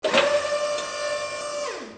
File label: hydrolic